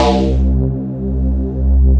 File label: Synthesizer Middle C Notes, Lead